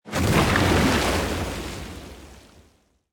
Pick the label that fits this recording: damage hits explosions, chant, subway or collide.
damage hits explosions